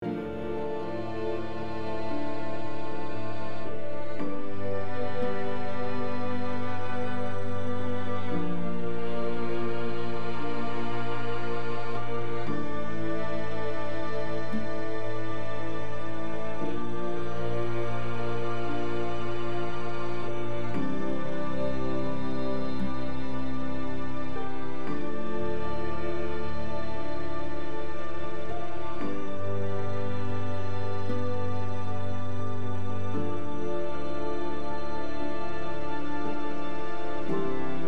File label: Neutral (Loop)